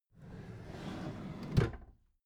packed drawer smooth clatter open soft

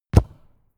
football kick

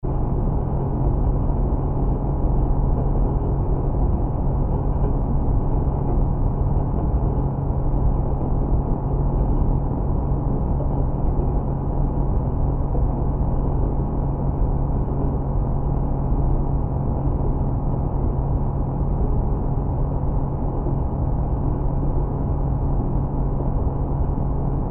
em transmission line

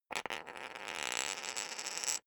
poker chip spin short

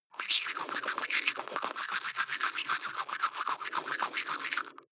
teeth brush dry closed front

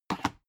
dvd case put down

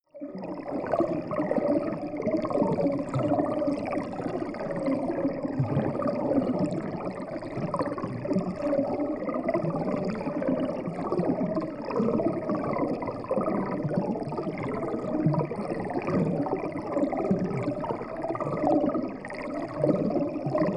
water heavy bubbling

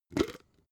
jar glass plastic open cap

